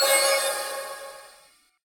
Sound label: news title, anime